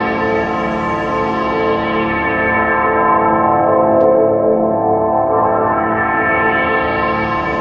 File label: Pad Atonal, Drum & Bass Pads; Sonic Foundry (Sony Creative Software); Knutrix Drum & Bass